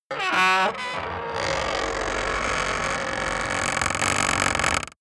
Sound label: wood gate close creak heavy